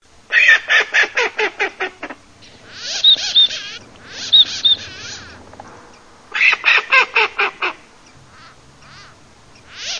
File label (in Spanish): GARGANTILLA